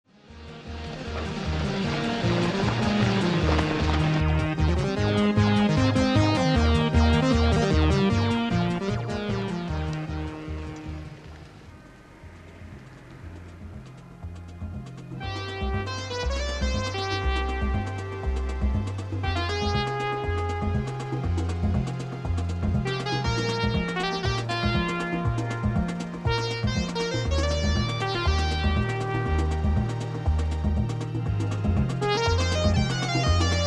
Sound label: synth; pappsynth